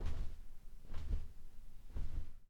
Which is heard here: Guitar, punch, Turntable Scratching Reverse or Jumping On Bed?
Jumping On Bed